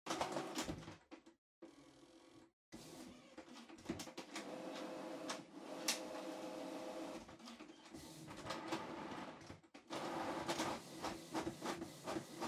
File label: Printer Far Print